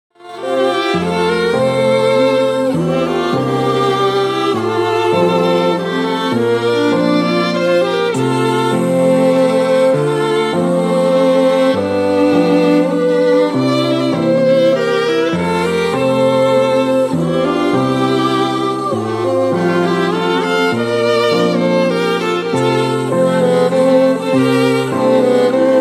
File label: German Waltz